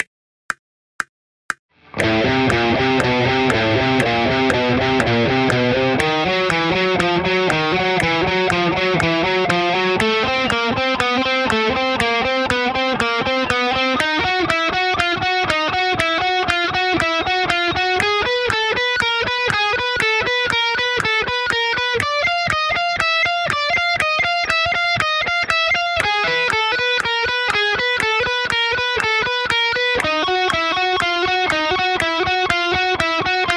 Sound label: chromatic traning